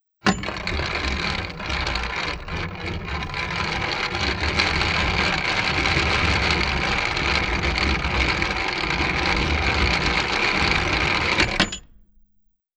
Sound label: Drawbridge down